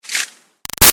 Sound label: Arrow By Ruffles Fast; Bows and Arrows; Sound Effects:Combat; Big Room Sound